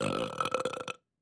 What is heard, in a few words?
Med Meaty Belch